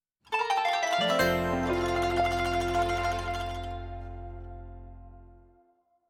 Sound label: Outro For Promo